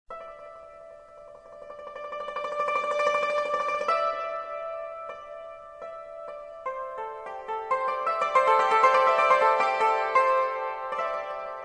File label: string